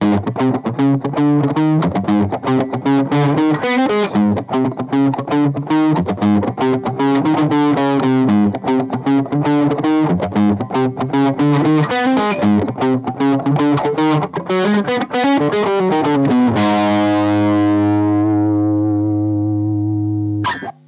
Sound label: orange 2x10 all ribbon eq; universal audio ox amp top box